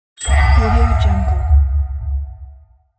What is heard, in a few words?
press start button